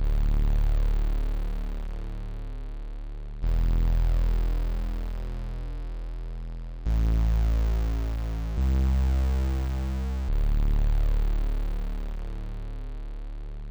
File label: Ambient Bass